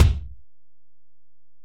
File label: Ac BD, Alesis DM-PRO; 300 Drum Machines